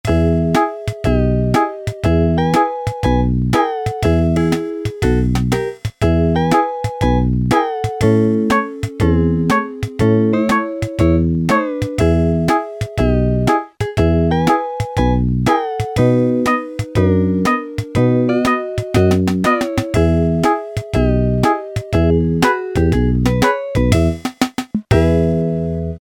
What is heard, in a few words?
COUNTRY, Yamaha SHS-10; Factory style demos